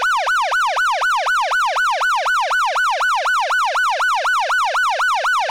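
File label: Federal Signal Omega 90 Wail, siren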